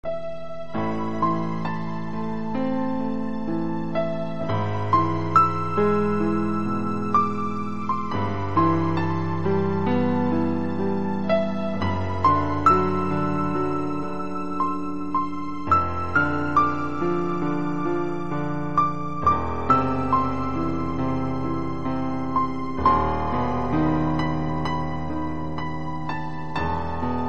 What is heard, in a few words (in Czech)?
klavir